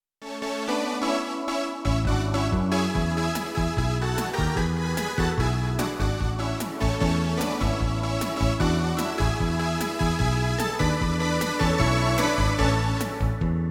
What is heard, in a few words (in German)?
Schlager Beat